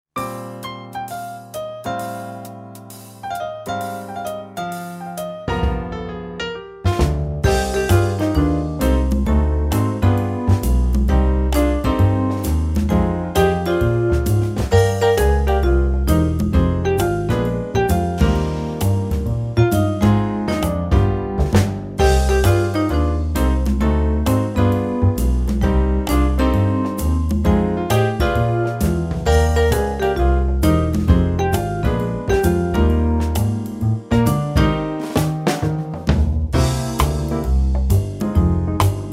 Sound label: brass minus